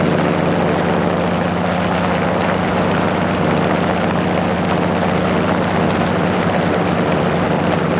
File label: engine plane rpm50 mid; War Thunder